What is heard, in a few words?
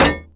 bullet on metal; Rabbit-Wars